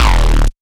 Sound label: D Electro, bass